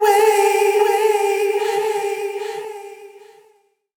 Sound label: vocal phrase wait, Gmin